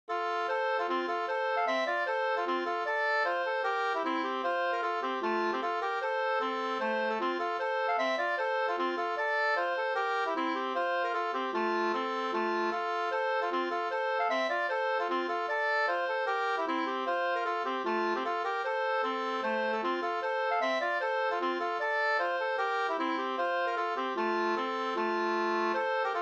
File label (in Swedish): schottis; Bjornfrossa fr Grycken av Goran Hellgren Sater 2st